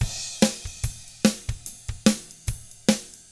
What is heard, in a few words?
ROCK; ILIO; Double Platinum Drums 1; 145 ROCK